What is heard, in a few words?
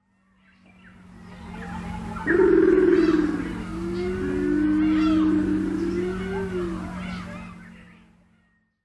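Japanese Wood Pigeon; karasubato; sakata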